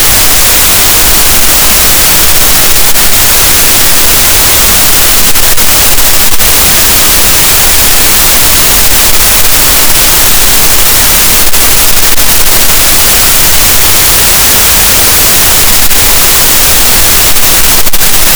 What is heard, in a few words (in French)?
cachalot, Physeter Macrocephalus, gaussian noise